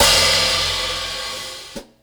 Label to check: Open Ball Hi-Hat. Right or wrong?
Right